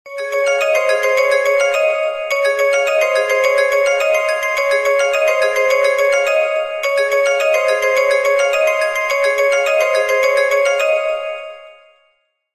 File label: beginning ringtone